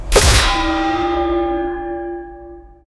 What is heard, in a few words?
death chime tactical gun